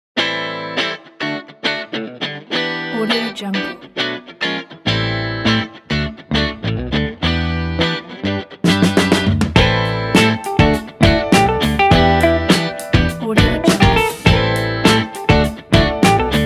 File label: optimistic choice ident